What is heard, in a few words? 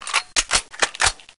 glreload; svencoop; hl2; oicw